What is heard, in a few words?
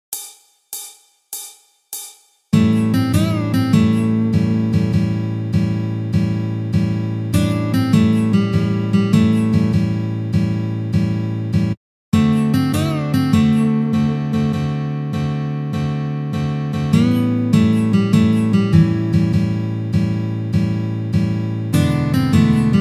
How To Play Blues Guitar